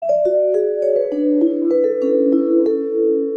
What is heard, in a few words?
Vibraphone; Nokia